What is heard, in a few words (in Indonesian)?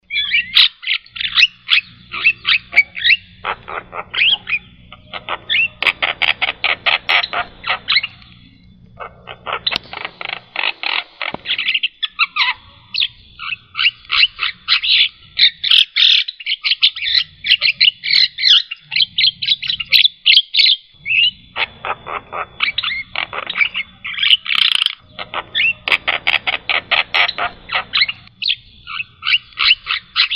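suara asli jalak kerbau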